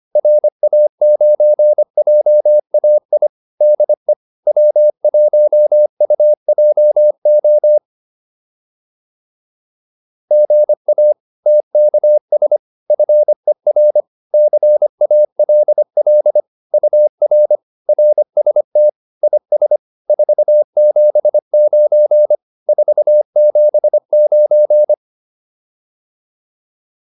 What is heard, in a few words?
QSO, advanced practice files